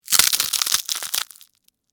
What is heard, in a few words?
Texture, Crack, Crunch, Ice, Cream, Cone; Food Sound Effects; BlastWave